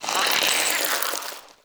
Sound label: Infested Plant Murmur; Ambience; Props; Warframe; Infested Eris